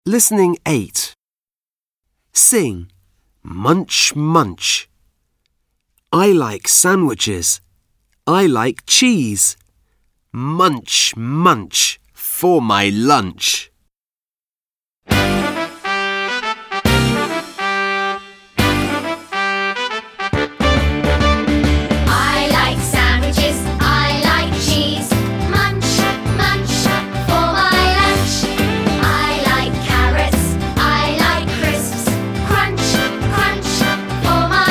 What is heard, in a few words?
Food Chant